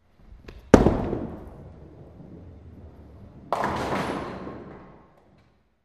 Bowling Ball Rolls

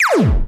laser, lasers

